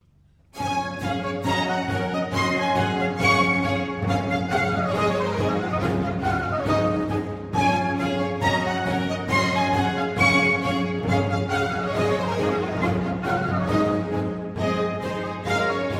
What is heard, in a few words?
Tambourin; Rameau; Dardanus; rameau regne amour